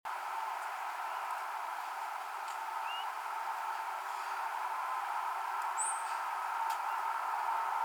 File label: GRSP super high pitched